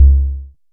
analog tonal, Kickbank; mpk mini presets; Ableton Foundation FreePacks

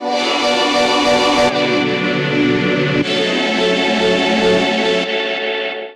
Deep Choral D Major 161 bpm, pads; MIDI Clips; Tonal; Skitter and Step; Ableton Factory Packs

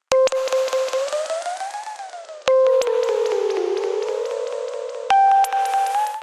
Bleeps G Minor 127 bpm; Ableton; Factory Packs; Skitter and Step; Ruffin

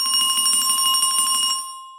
Glockenspiel Brass Rolls; Ableton; Orchestral Mallets

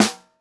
SN1 Bottom, snare

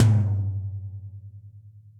Tom Low Big Punchy; drum hits; Ableton; Factory Packs; Session Drums Club; Standard Hits

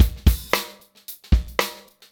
113 HipHop Live Drums

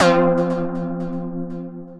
Synth Keys; Pathos Key Coplean

